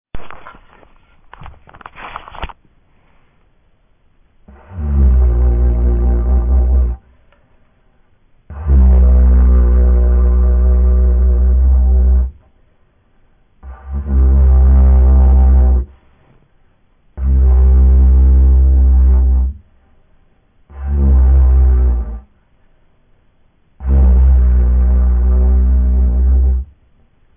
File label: didgeridoo